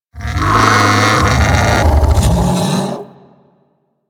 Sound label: Vocal Alerted; Warframe; Hunting Critters; Undazoa